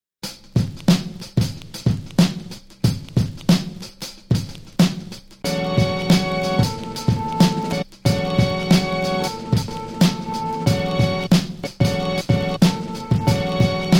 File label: Drumkit in an Elevator